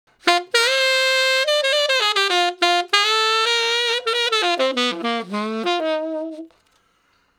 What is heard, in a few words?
Ten Sax Straight (F), R&B; Solos